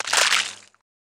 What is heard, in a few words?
Egg Splat Wet Squishy